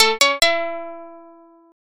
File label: sonic logo; media kit